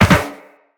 Land Soft Metal Perforated, Footsteps; Characters; Operator

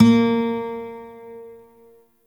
Shawzin Oct One A Sharp; Warframe; Lotus Shawzin

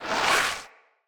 Corrupted Battle Dog Skid; Foley

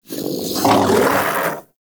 Predasite Vocal Alerted; Warframe; Hunting Critters; Characters

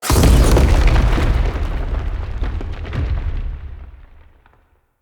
Cannon Shot Wall Explode; Level Events; Midrath; Warsong Prologue

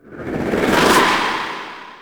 Blast Charge; Queens Chamber; Gameplay; The War Within; Warframe

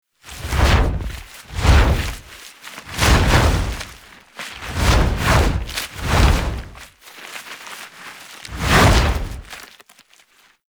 Deployment Cannon Loading Card Loop Passby; Warframe; Hub; Tau

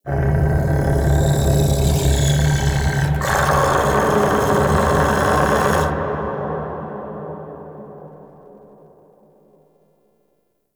Shouts; Warframe; Man In The Wall; Entrati

